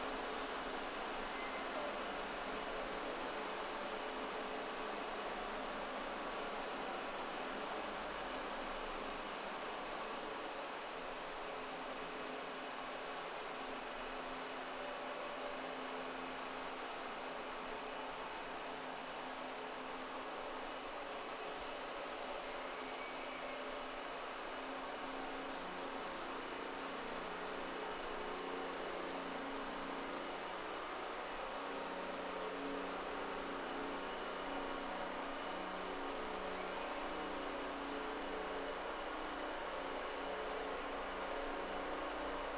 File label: Whales; SeaSnake